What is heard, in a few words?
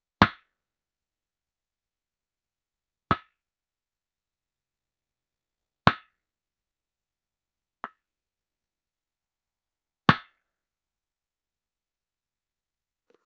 sound of tennis racket hi